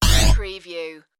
sci-fi game menu slide

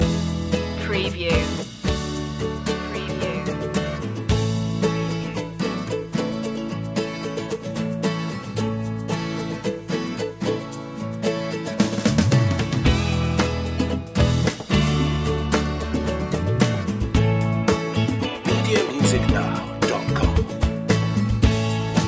moving forward loop

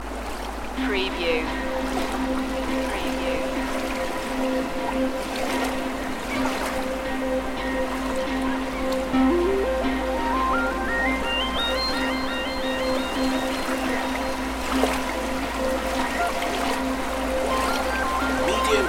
at the seaside loop seaside fx

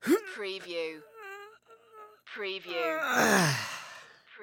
Grunt Strain Male

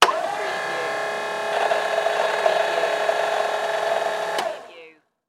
Hi Lo Motor Operate

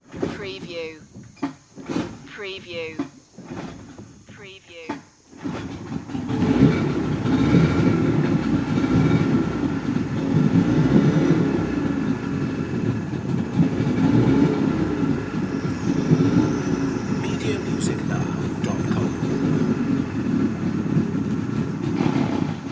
motor boat start

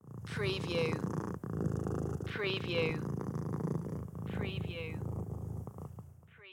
Cat Purr Long

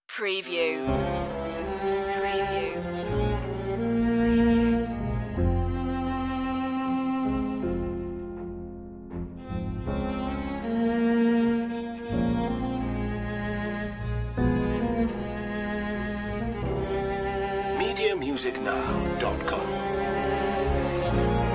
music box

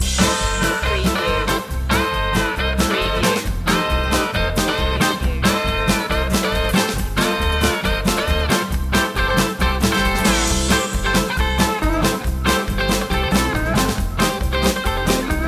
stomp loop

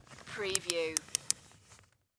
Plastic Bag Close

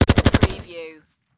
Machine Gun HKG36

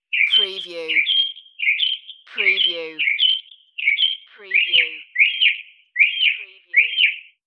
Mocking Bird 2 Calls

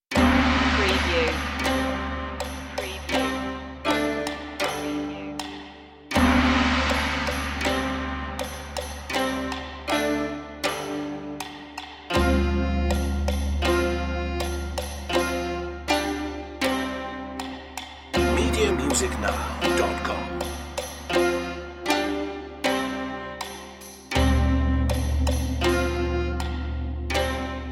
asian ceremony underscore

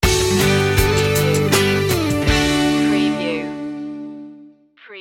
sting

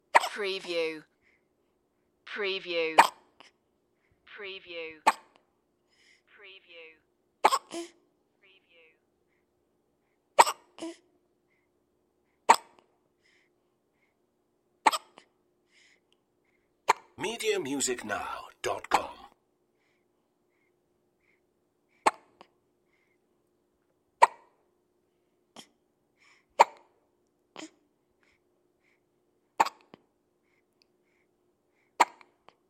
Baby Hiccuping; Human Sounds / Other / Misc Sounds

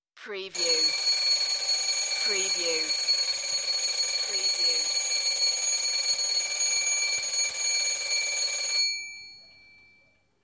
Alarm Clock

